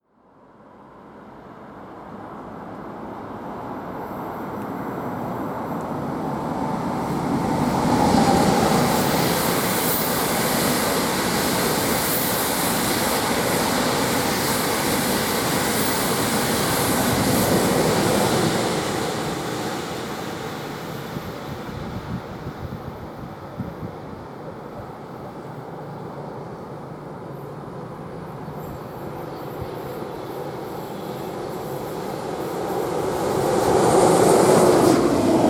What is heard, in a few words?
deutsche bahn sound